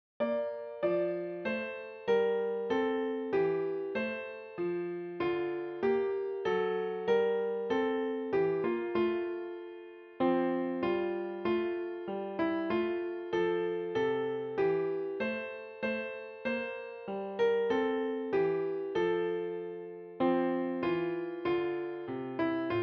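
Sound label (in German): Klavier; Hark The herald angels sing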